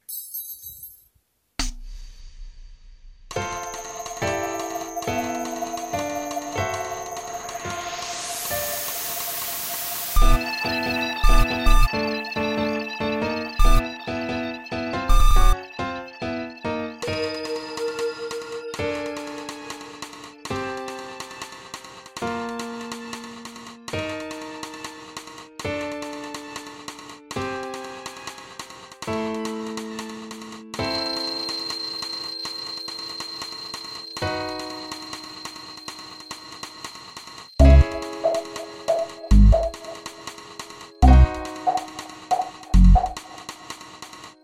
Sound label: song karaoke version